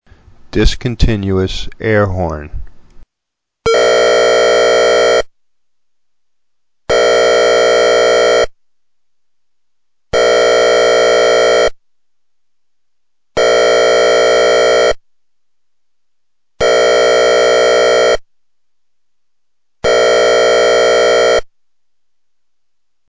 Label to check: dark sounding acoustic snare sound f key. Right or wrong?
Wrong